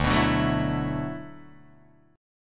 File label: D 6th Add 9th D, Guitar, Open D